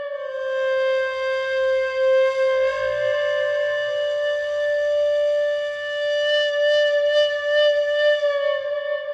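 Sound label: G MIN FLUTE, Organic House / Deep House Sample Pack Oriental & Middle Eastern; Azura – Organic House Sample Pack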